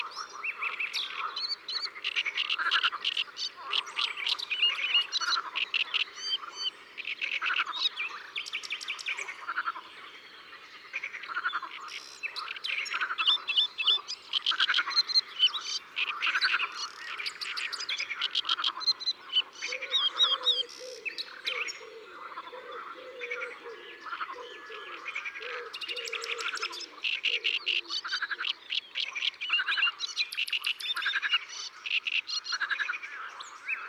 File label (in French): Rousserolle turdoide; Drome; Concerts Naturels de la Drome; Studio les Trois Becs